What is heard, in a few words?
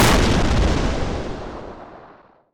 bomb, battle